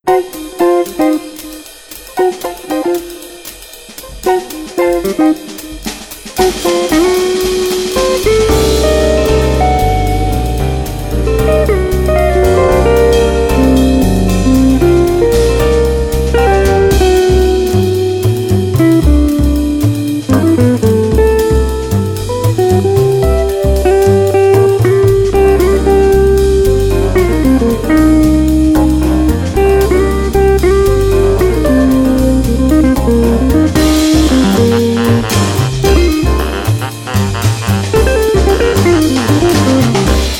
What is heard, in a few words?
jazzy pop